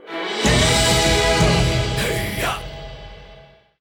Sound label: Goal Fanfare; Tiki Turbo - 2nd Place Goal Fanfare; crash bandicoot series sound box